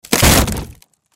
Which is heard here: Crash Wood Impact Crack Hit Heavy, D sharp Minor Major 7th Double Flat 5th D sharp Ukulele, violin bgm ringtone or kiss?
Crash Wood Impact Crack Hit Heavy